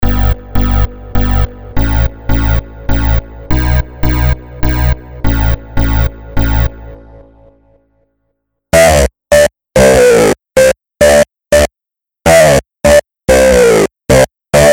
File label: Bass